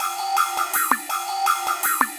Deadrock, Synchro Funk, Beats; Sonic Foundry (Sony Creative Software)

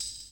KIT, Dance Mega Drums, HIP; Best Service

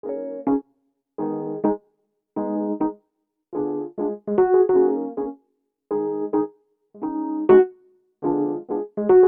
Keyboard; Sonic Foundry (Sony Creative Software); Infinite Soul VOL-1

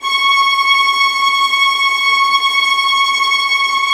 VIOLINT, Synclavier Strings; ILIO

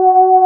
VO G3, ANA VOX, SYNTHESIZER; AKAI S6000 CD-ROM - Volume 1

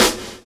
OPEN FIRE; Univers Sons - Kitch 70s

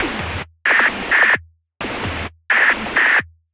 Gated Seq Noise, Gate Filtered 130BPM

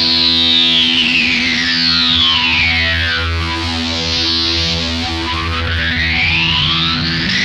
BIG LASER, Funk Guitar; Zero G - Funk Guitar

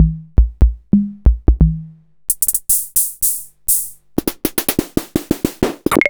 Korg Minipops 120P

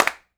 CLAP R; Big Fish Audio; Ross Garfield Drums VOL-2

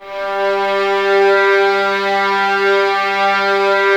Violins G 3, String Ensembles; Light; Miroslav Vitous; 11 Violins

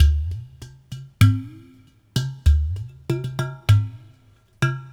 Udu, Ethnic Percussion; Sonic Foundry (Sony Creative Software); Ambient Atmospheres & Rhythms

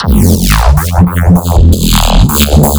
reese; bass

